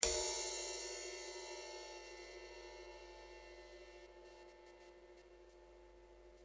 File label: ride bow